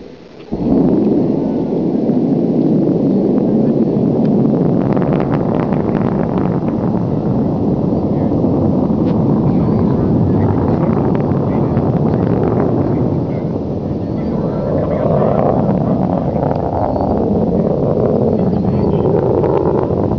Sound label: takeoff, Delta-II; VAFB